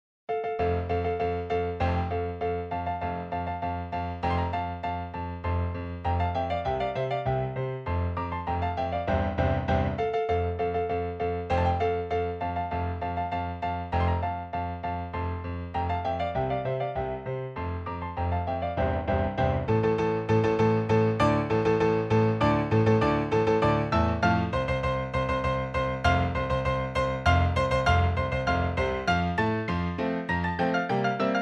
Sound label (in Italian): Marcia Militare Trascrizione Pianoforte; WoO18; Ludwig van Beethoven